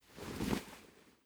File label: torch deploy; Rust